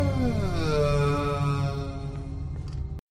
Door Sigh

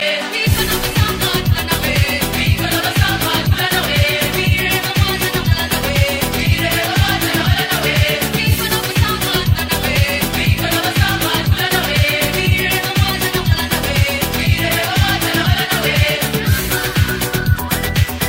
Samba

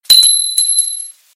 Pin Drop

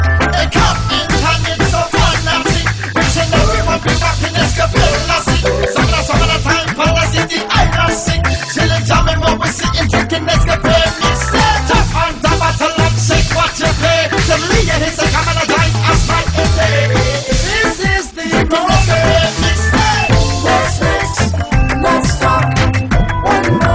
Ringtone Rapmix

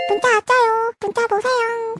Korea sms cute

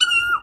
Telephone; Furry

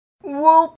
Woop; Wooper

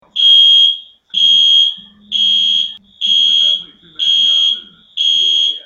Fire Alarm; Erau

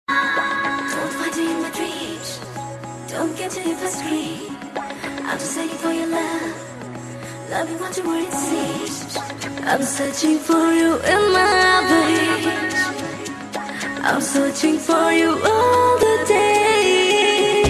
Punjabi Tone

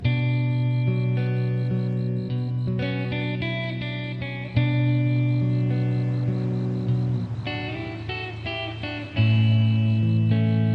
Romantic Guitar

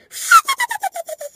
Turbo fluter

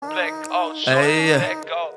Blackout Loop

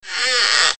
Open Door

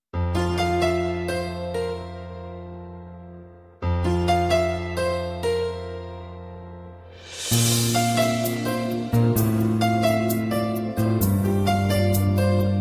Melody Rain

